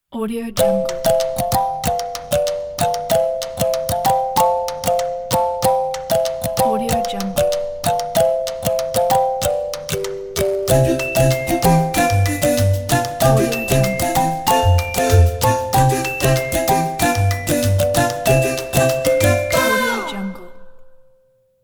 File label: playful kaleidoscope ident